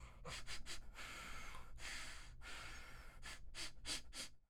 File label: Sniffing